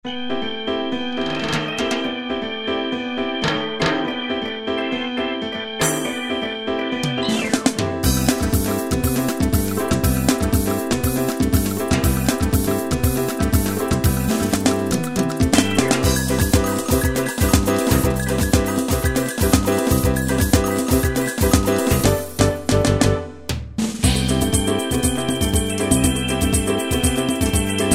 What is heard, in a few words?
Samba, Yamaha QY70; Preset Styles